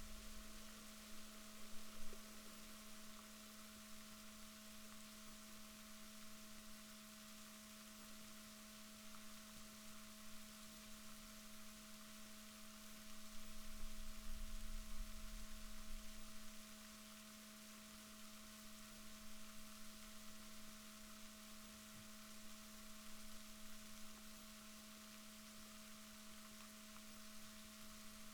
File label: rorquals negatif, Balaenoptera Physalus Chile